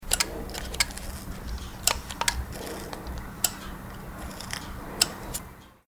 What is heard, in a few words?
craftsmanship